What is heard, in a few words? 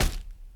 Hitting Cabbage Against Wooden Door Frame